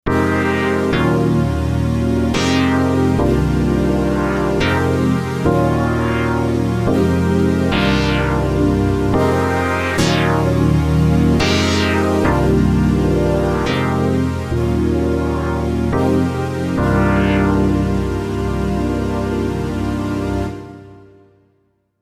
Ninth Chords on Stacked Polys

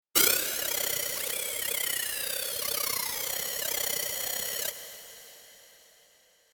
insectoid

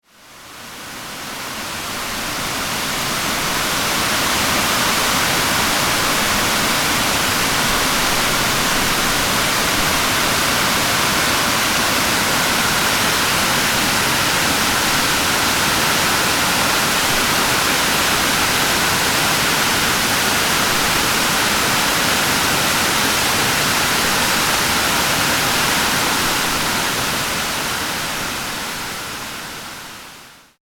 water over the coonrapids dam

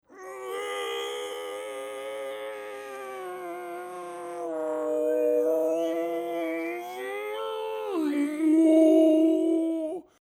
throat singing

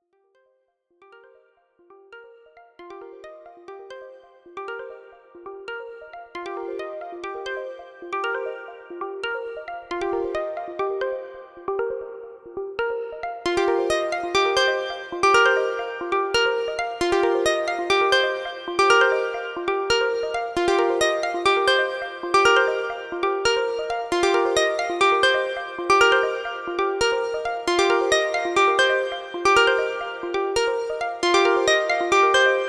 Evolving Arpeggio with LFO on VCF

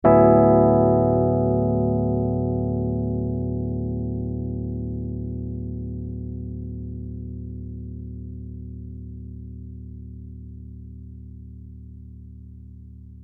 rhodes chord d7 13 b5 poly e d

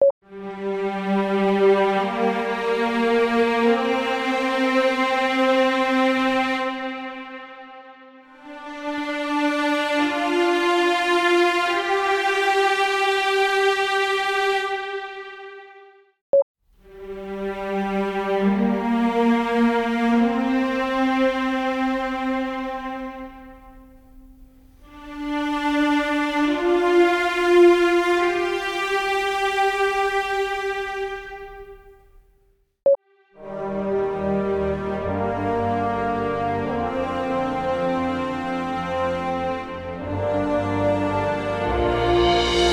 Synth vs Live orchestra examples; Tom Player

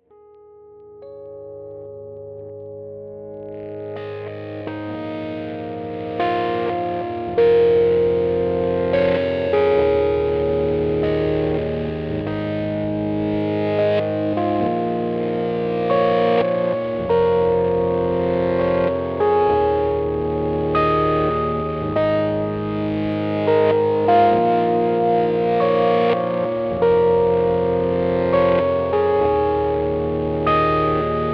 untitled processed rhodes

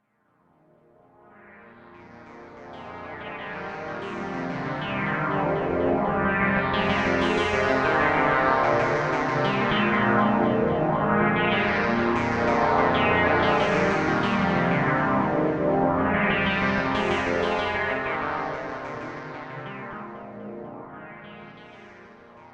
Spacey D50 Arpeggio

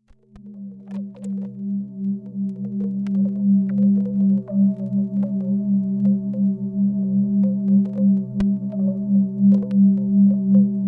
Juno 106 Glitchy Drone

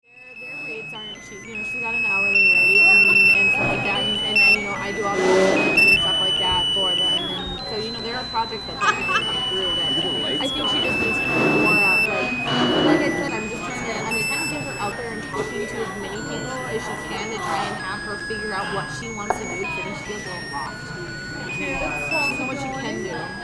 musical snowman lighter in the pub

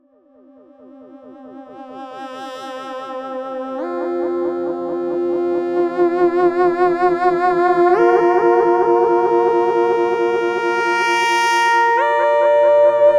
Sub37 Slow Melody

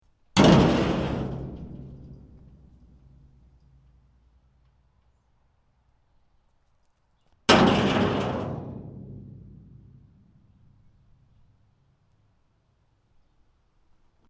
cement chunks in dumpster

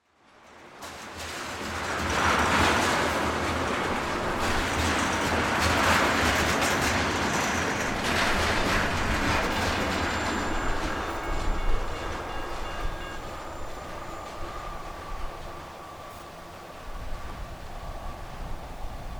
gravel foot stomp post train

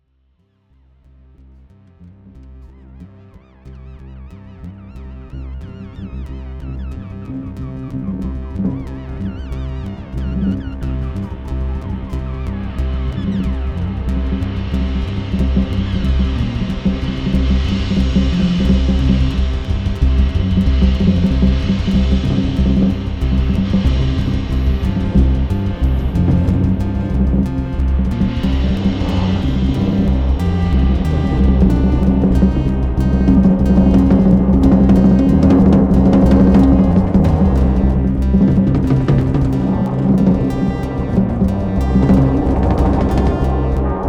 Resampled Chaos over Bass and Pro-One

